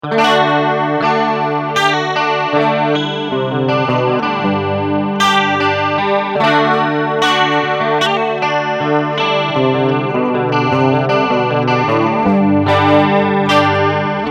Multi Chorus

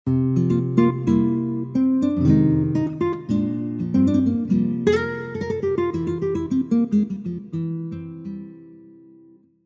Yamaha Genos2 Classic Nylon Neck

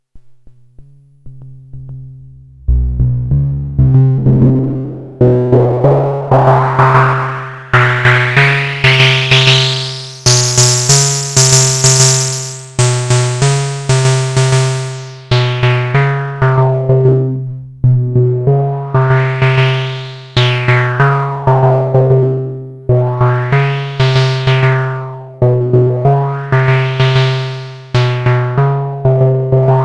Erica Synths DB 01 Filter

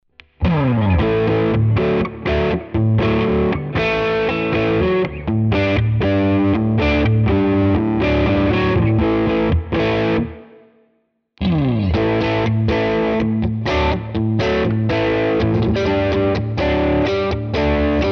AZ2207F crunch all positions; AZrunch 2

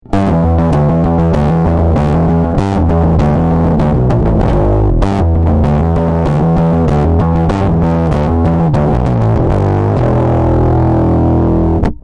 heavy

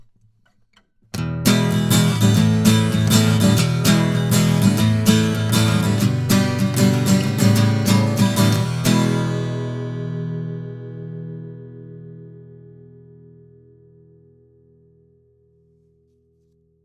Acc Gtr vintage steel tone; Warm Audio

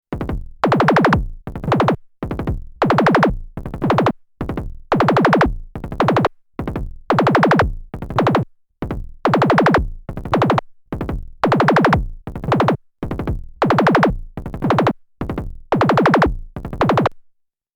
Moog DFAM Tom Repeater

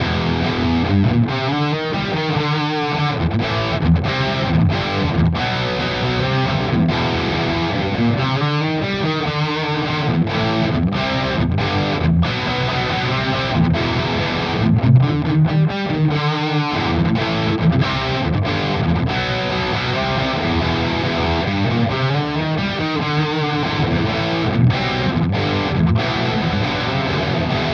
Epiphone Prophecy Les Paul Zerre Bridge Vintage

